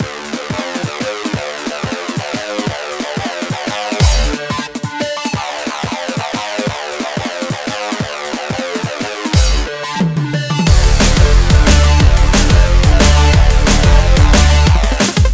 electric stream 180bpm

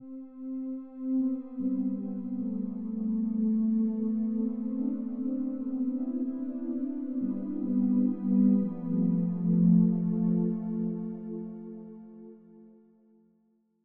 lose music

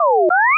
laser

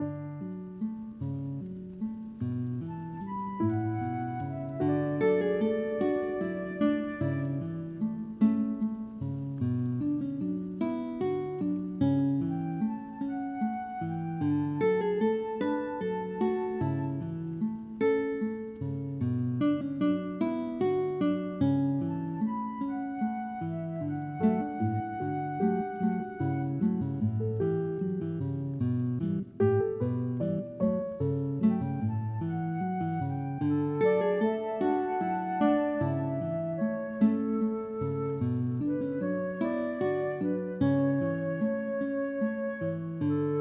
inn music

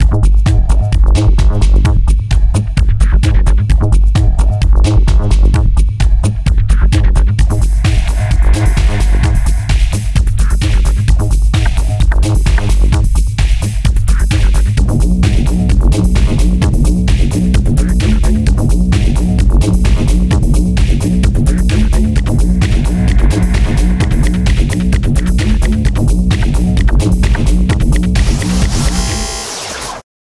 Level Music

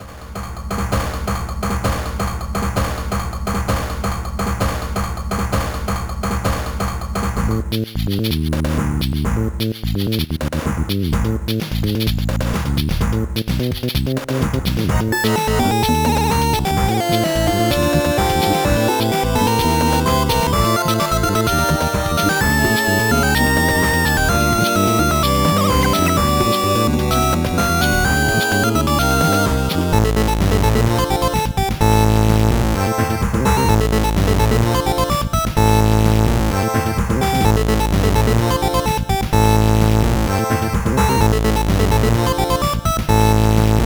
kidnapping

